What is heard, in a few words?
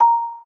cam timer 1sec; LG VS740 Ally